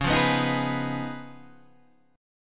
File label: Db 9th, Guitar, Open D